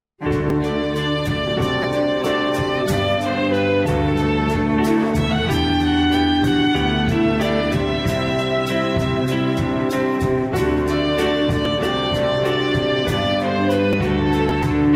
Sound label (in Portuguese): Quarteto teclado e percussao